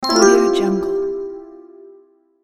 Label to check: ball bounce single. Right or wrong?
Wrong